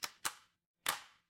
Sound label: door lock